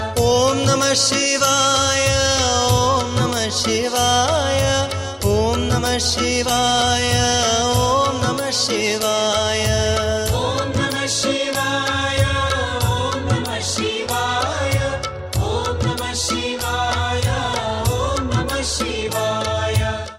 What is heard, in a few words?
Aum Namah Shivay Dhun, Bhajan; Art of Living; Religious Mp3 Ringtones